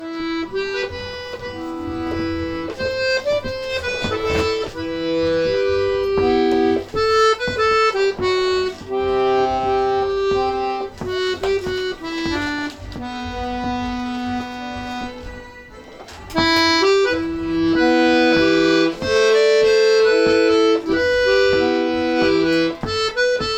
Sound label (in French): Mazurka 1ere Partie; Mazurka Manana